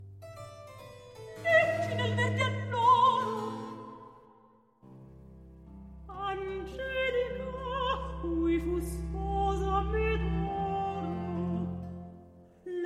Recitativo; Vivaldi; Jean-christophe Spinosi

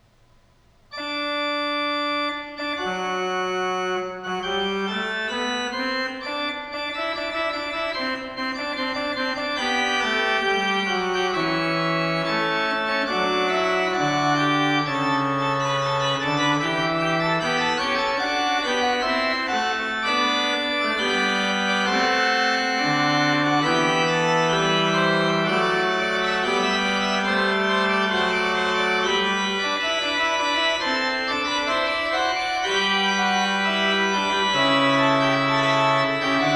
Fugue, Organ; Magnificat octavi toni; Johann Pachelbel; Complete Organ Works

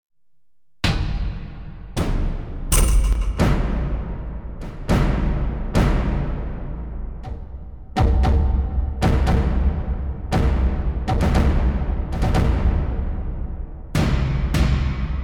Percus; Troy

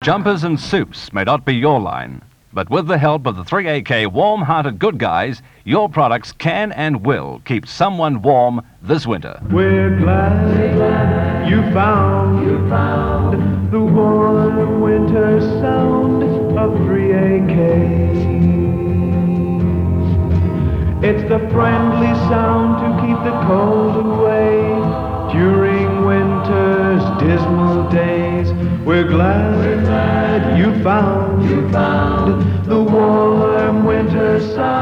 Jingle; The Warm Winter Sounds Of 3Ak

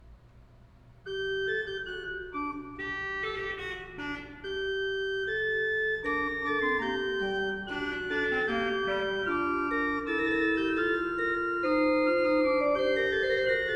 Fugue, Organ; Magnificat secundi toni; Johann Pachelbel; Complete Organ Works

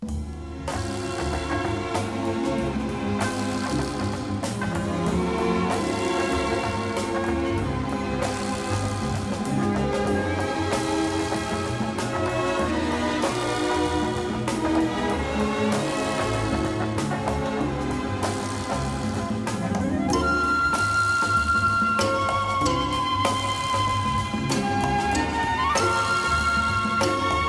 Strings

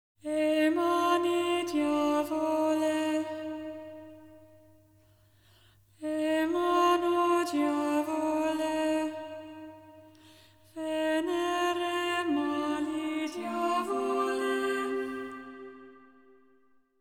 Cathar Rhythm (Soprano Melody)

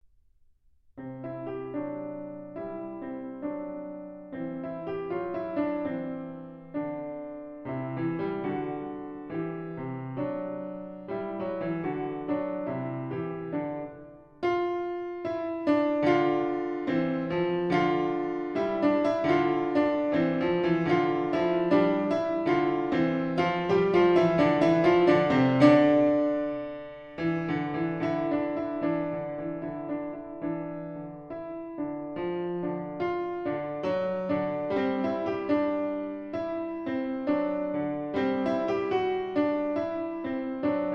Triplets, Piano; Book 3; Mikrokosmos, Sz. 107; Bartók; Complete Solo Piano Works

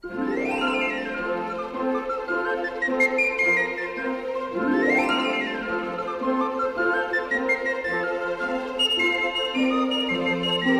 Pennywhistle Jig; Traître sur commande

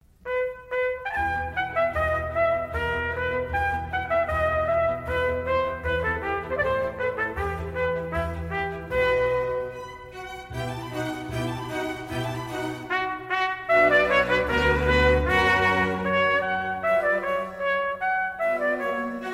Trumpet Concerto in E Minor, Gavotte; Baroque Trumpet Concertos

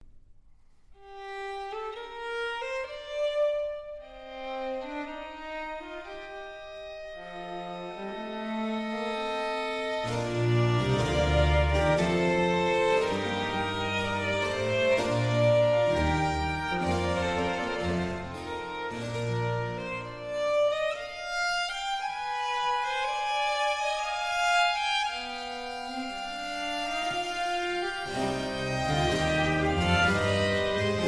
Concerto for Strings in G Minor, Largo; Vivaldi; Concerti per archi II; Concerto Italiano

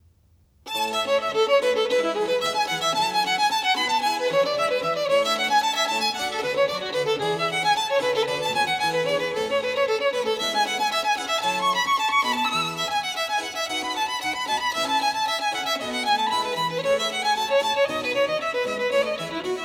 Violin Sonata in A Major, Allegro; Corelli; Arr Donington; Op. 5 No. 6; III. Allegro; The Menuhin Century; Yehudi Menuhin

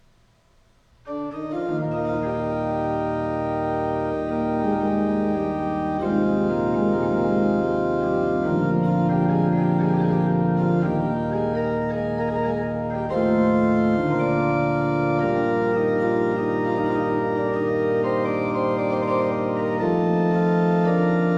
Prelude in Eb Major, Organ; Johann Pachelbel; Complete Organ Works

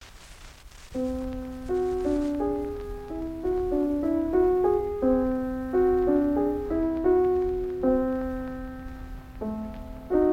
Whole-tone Scale; Book V; Béla Bartók; Mikrokosmos, Sz. 107

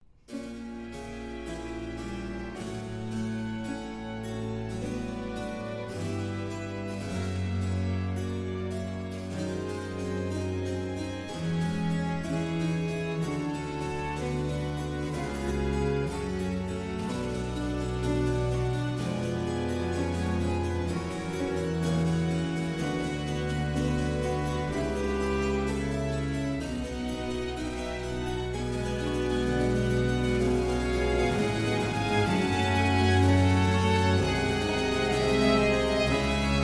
Concerto for Strings in F Major; Vivaldi; Concerti per archi III e concerti per viola d'amore

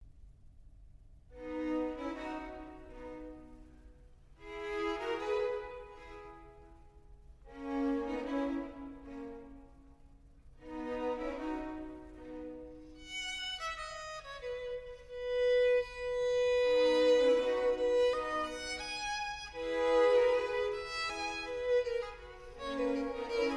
Concerto for Violin and Strings in C, Largo; Vivaldi; Violin Concertos Nos. 1-6

